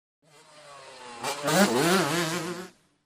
Motorcycle Motocross; By 125 Cc Honda, Cu Fast By L / R, Shot Cu.